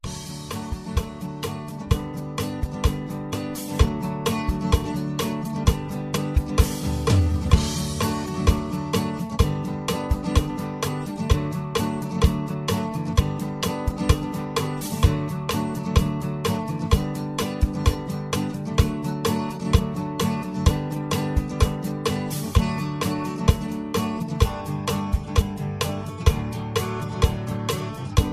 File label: Instrumental Lower Key